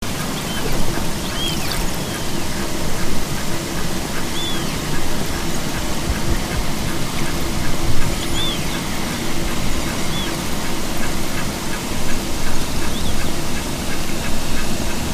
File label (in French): Globicephale cargo; fiches cétacés